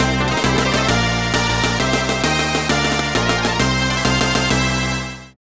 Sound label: Battle Victory; Victorious Booms; super bomberman; snes